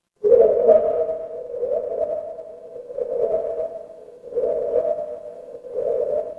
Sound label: horror ambience dark